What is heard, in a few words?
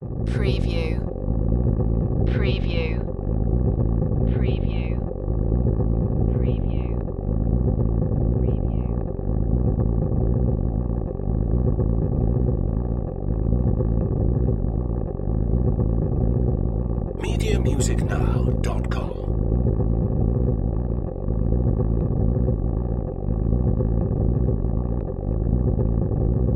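Industrial Bass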